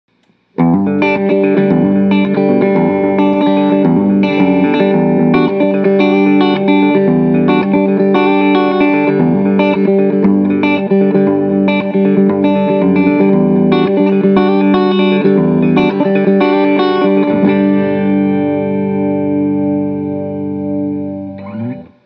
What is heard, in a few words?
Fast Moderate